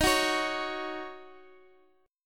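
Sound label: E flat Minor 6th; strummed; Minor 7th; x,x,x,8,7,9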